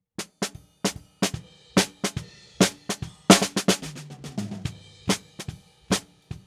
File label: SNR BTM